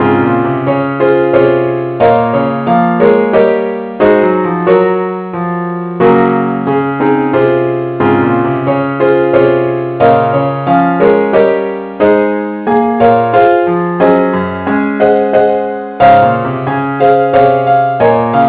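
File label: keyboard only key c sharp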